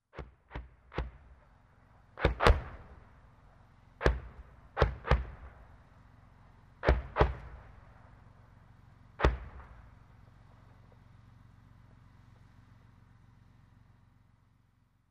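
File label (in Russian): минометный огонь отдалённые взрывы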